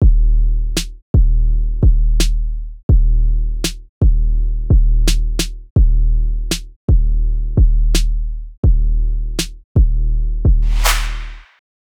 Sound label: 808 beat, 82 bpm